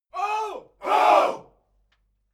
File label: military shout "oh"